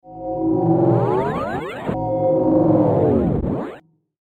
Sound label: Future Power Up And Down